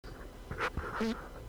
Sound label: fart